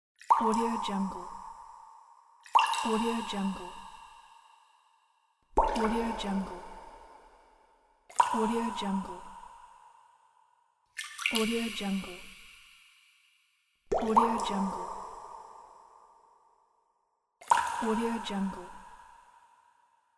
water drop with reverb

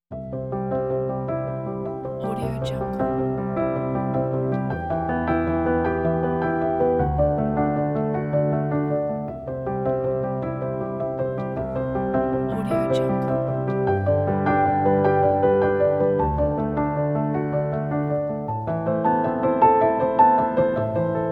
storytelling solo piano

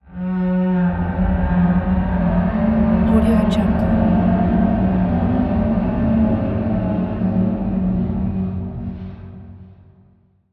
tension drone; asylum

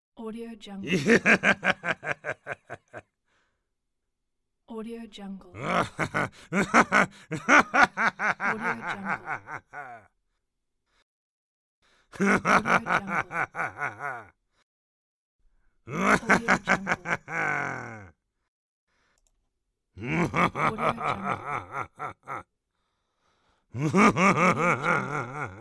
bad man laugh

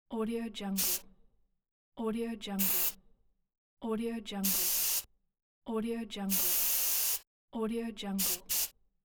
spray paint

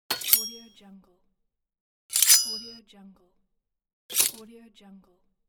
knife

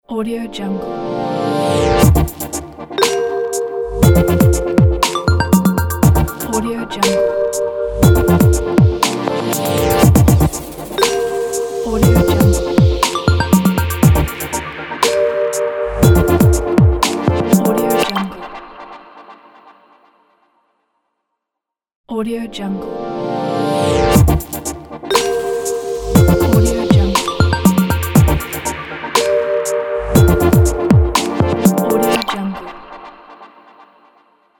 podcast intro logo

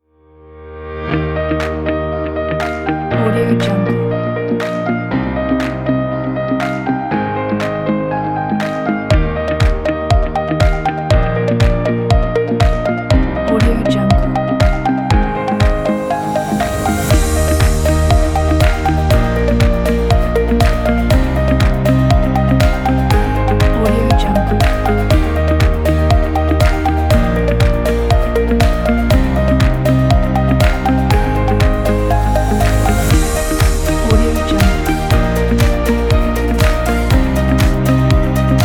upbeat corporate

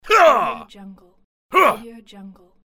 attack shout grunts

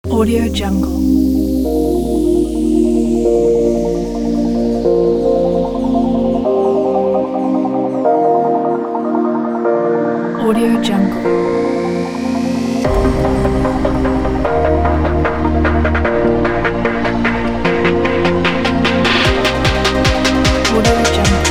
future bass flow

